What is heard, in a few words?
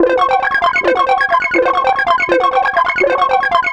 rock; PCI audio card; C-Media 8738